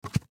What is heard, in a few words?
Book Close Large, Book Close Cardboards; Books; Offices; Blastwave; Foley